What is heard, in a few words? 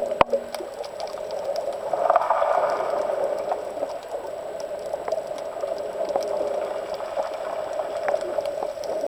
underwater creepy sounds